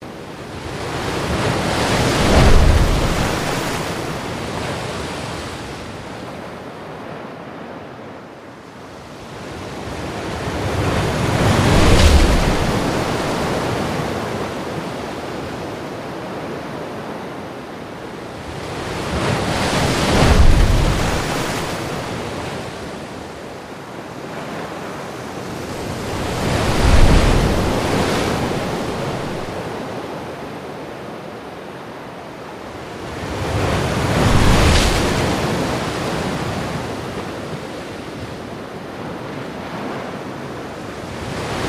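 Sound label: Water Wave Splash Seawall Loop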